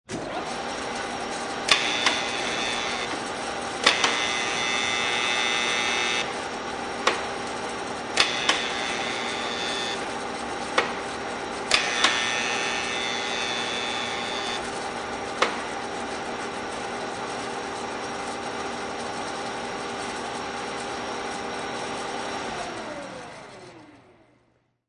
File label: Radial Drill, Start up, various drill bursts, stop; Industry; Tools; Lynne Music